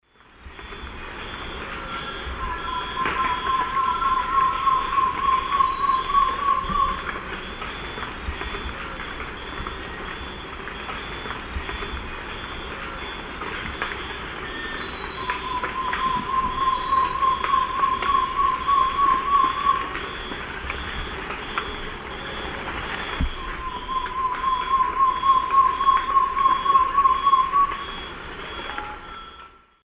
Odontophorus gujanensis, contact calls; S. America; Eastern Lowlands

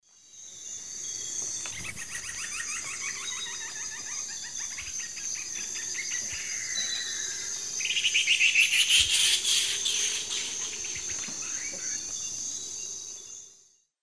Dendrocolaptes certhia, calls; S. America; Eastern Lowlands

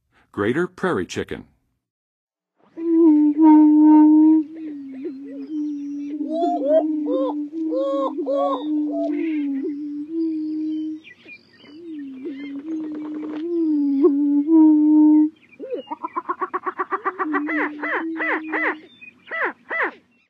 Greater Prairie-chicken, Birds of North America; Western USA; Western USA Songbirds

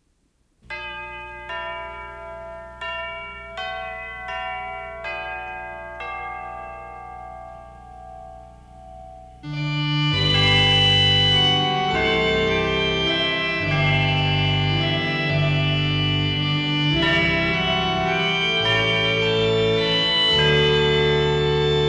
Christmas Organ & Chimes

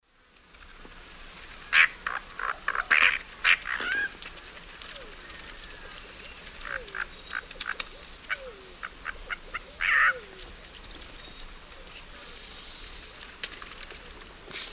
Chordeiles rupestris, call; S. America; Eastern Lowlands

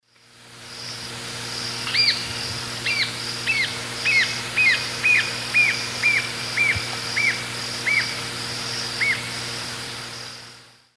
Galbula dea, song; S. America; Eastern Lowlands

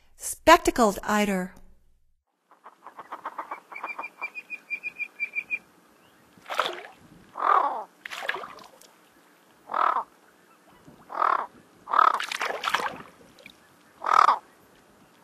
Spectacled Eider; North America; Stokes

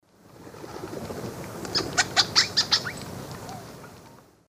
Molothrus oryzivorus, calls; S. America; Eastern Lowlands

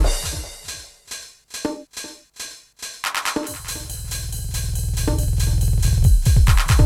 techno 140

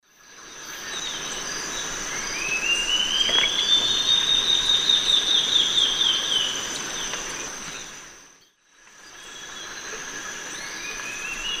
Sittasomus griseicapillus, song; S. America; Eastern Lowlands

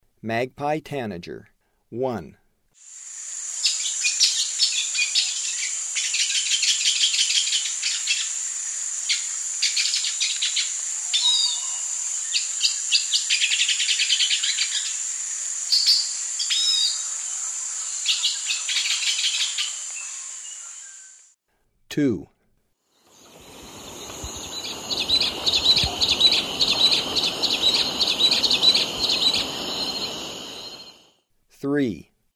Magpie Tanager; S. America; Eastern Lowlands

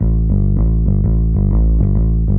X Bass, Rock Bass

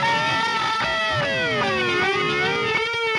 Slide Wail, Rock, Guitars

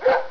DOG, animal; 动物

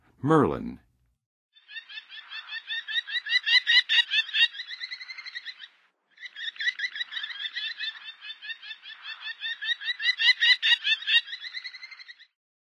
Merlin, Birds of North America; Western USA; Western USA Songbirds

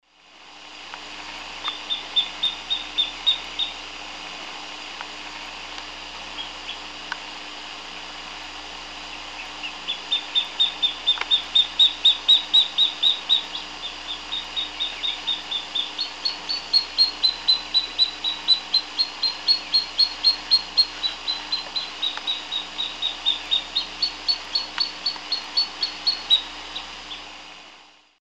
Gampsonyx swainsonii, song; S. America; Eastern Lowlands

